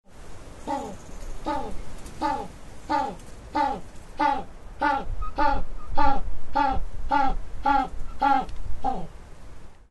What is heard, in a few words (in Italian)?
Pinguino